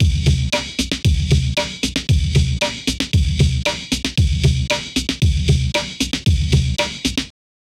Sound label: amen break, breakbeat